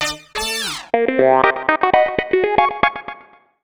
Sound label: success; PonPonChun; android